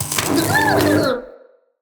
Shock Mote; Motes

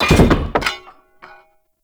Debris Metal Wood; Warframe; Entrati; Ambience

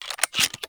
Reload, Weapons; Warframe; Gorgon

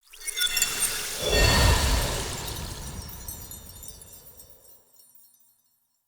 Offering Shrine Success; Midrath; Fey Hub

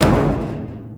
Metal Barrel Impact; Warframe; Ambience; Nineteen Ninety Nine

